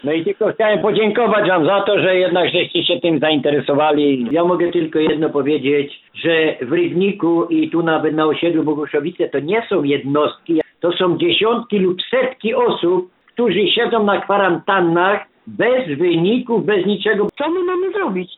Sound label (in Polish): smiech